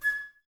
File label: Flute, Woodwind